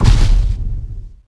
stomp, Dino; Brach

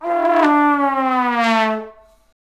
music death; TyTheTasmanianTiger3; XBOX

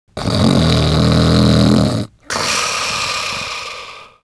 Snore LOOP; Cars; XBOX

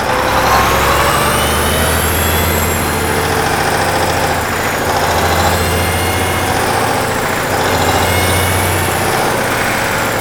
amb crane motor; Terminator 3 Rise Of The Machines; XBOX

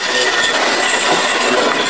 Pipe Jib; XBOX; Amped 2

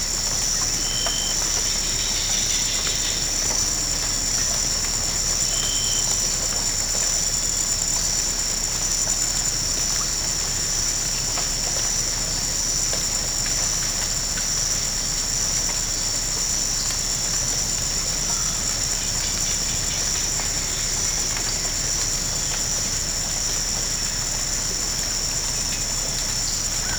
Amb Lite Jungle Rain; Bruce Lee Quest Of The Dragon; XBOX

